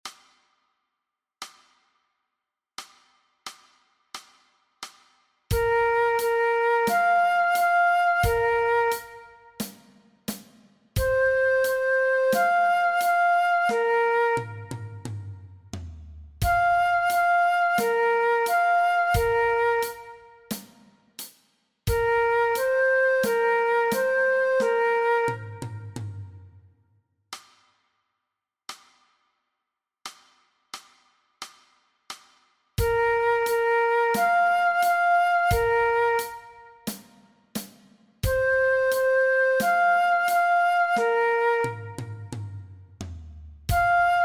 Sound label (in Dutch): MEESPEELTRACK Dwarsfluit alternate; Dwarsfluit aangepast; Nij Talint Orkest